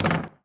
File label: Street Ball Glass Back